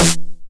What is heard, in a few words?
909 SD Tape Sat